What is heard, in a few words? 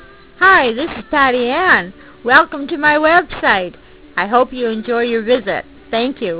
welcome music